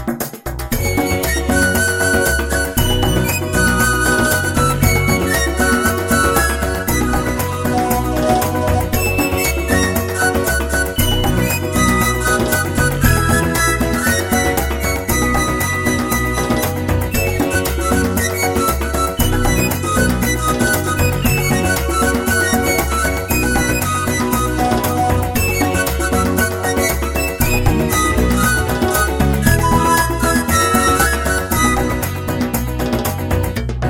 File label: Bajon